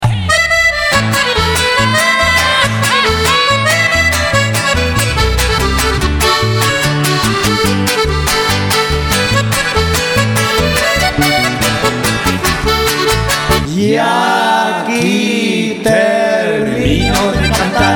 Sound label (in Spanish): Corridos